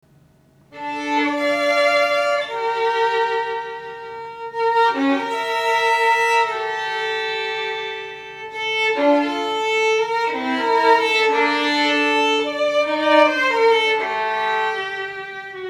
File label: violins; Catellani; 1999